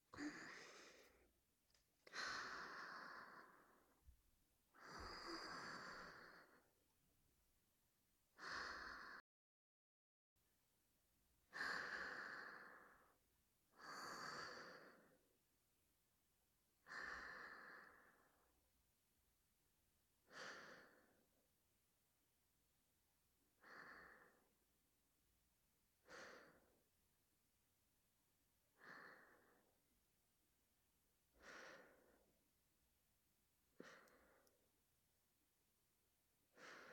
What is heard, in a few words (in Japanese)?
吐息＆寝息のみループ; オマケ・吐息寝息トラック